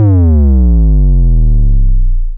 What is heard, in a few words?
BD Sub; VEC1; Club